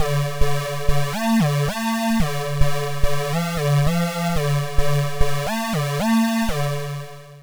hexsaw rave; cyclebox

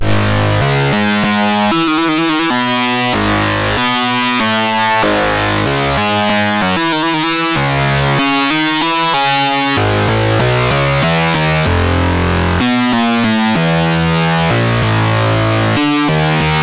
cyclebox hexsaw sine fm

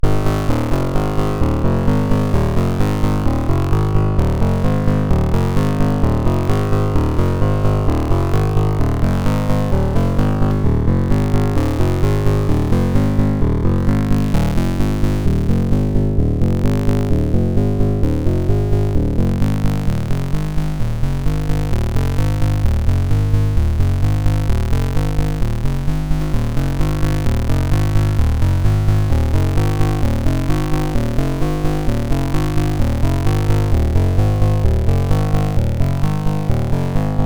cyclebox sine sinepulse1 folded fm

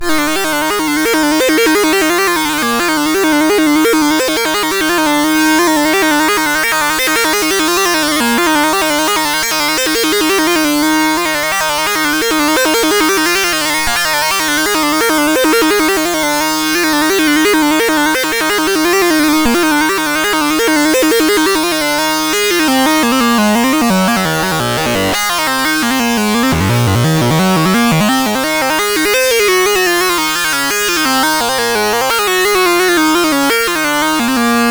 cyclebox hexsaw sine fm

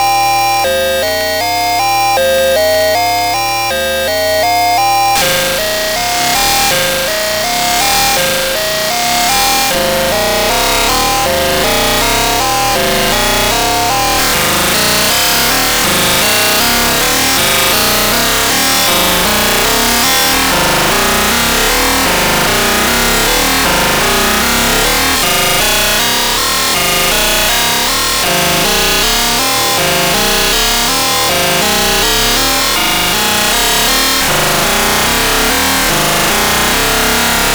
cyclebox sinepulse1 saw varying folding

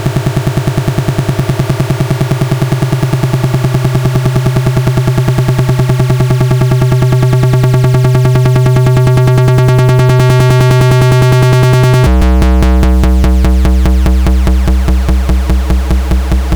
hexsquare ext sync varying pitch detuning; cyclebox; ext sync from afg

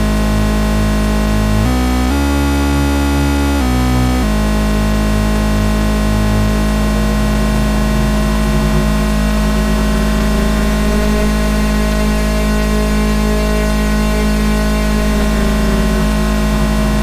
cyclebox hexsaw saw varying ifm

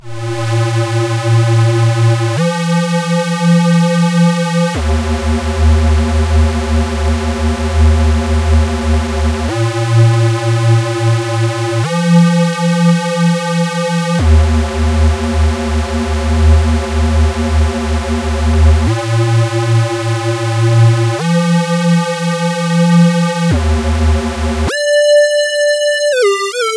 hexsq solo; cyclebox

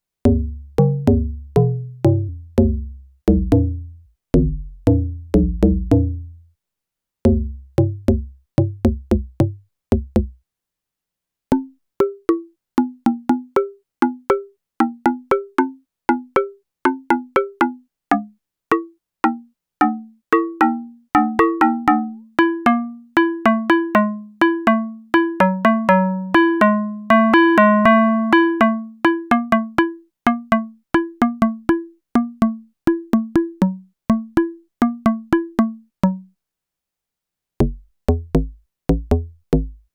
cyclebox II exp perc fm

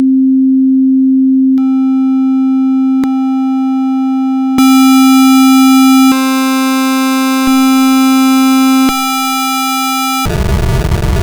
basic waveforms; cyclebox

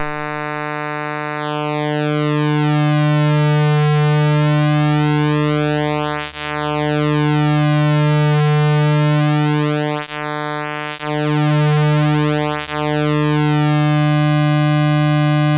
cyclebox pwm

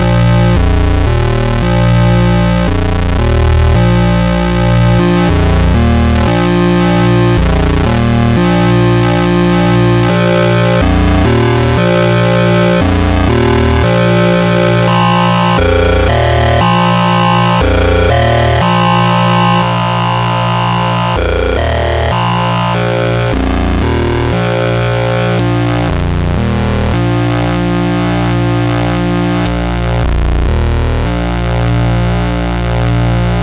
cyclebox sine square then saw varying ph2

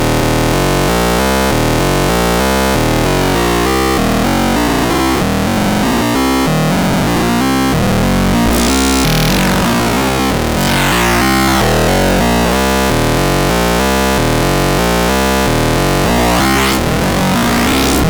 cyclebox II sine saw changing ph2

